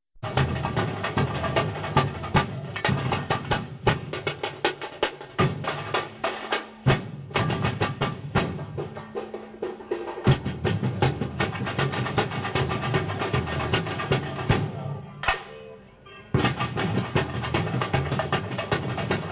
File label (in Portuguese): Break Ao Vivo; Tijuca; Rio